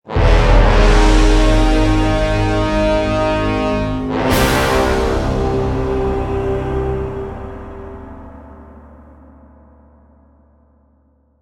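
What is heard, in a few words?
Grand stab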